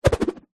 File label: throw object spin whoosh air; foley